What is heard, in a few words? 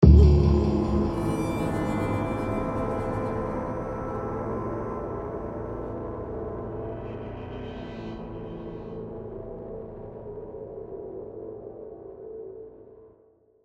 High Outro; Human Single 2 High Outro